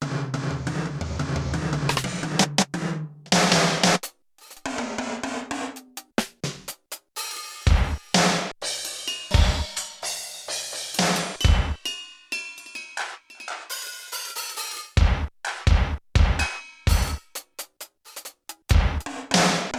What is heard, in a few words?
L-R Stereo, Drum Kit, Yamaha TX16W